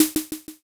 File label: snare